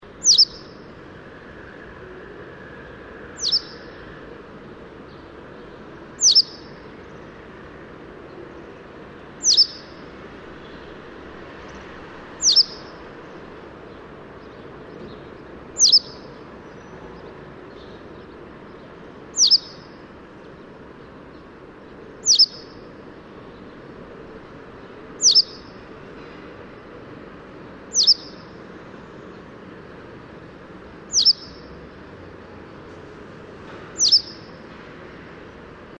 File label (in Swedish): Sädesärla